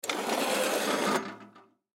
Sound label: Film Light Drag, Film Light Arri Halogen Drag Stand Scrape Cement; Miscellaneous Electronic; Foley; Blastwave